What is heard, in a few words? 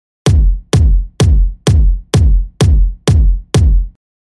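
Future Bass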